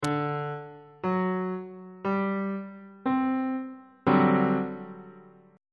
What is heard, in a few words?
g major 7th suspended 4th 2nd inversion